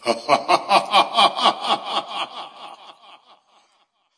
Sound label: evil echo laugh, male; MISC and SPOKEN WORD; VOCALS and SPOKEN WORD